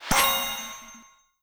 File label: Team Skill Good; disney star smash android ios mobile gamerip 2020